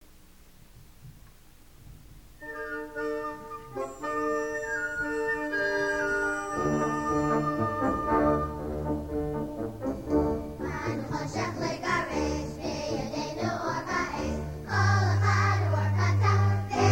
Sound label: choir and navy band, hanukah; the hebrew academy choir; us navy band